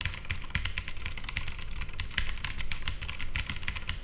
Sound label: Blue Switch